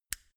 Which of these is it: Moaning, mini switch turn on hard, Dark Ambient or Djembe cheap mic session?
mini switch turn on hard